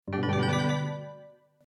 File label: collect; fruitcake